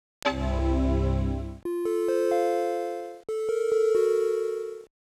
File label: Startup And Death Chime